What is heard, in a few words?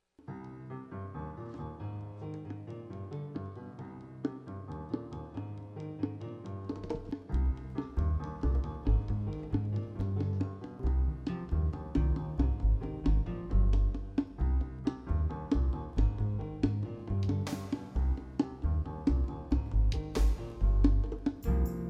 latin rhythm